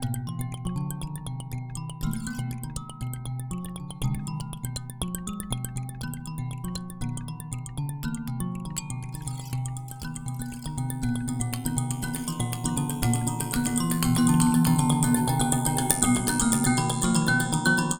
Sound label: kalimba